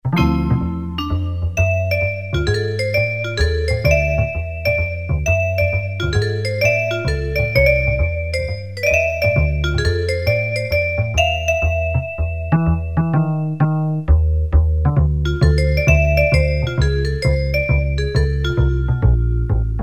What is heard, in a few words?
Vibes; Generalmusic pRP8; Preset demos